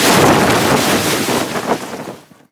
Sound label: cloth long